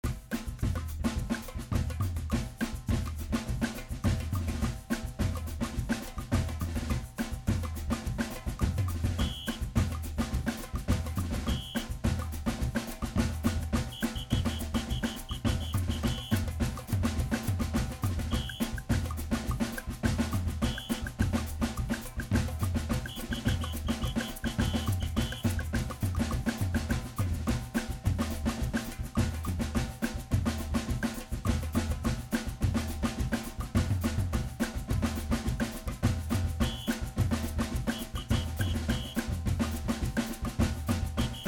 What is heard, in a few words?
drum school music; afterbeat